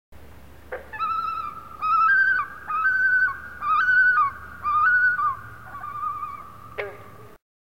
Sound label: flight tremolo; UWEXLakes